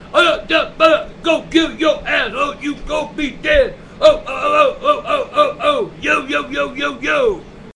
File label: Rapping; AngryGrandpa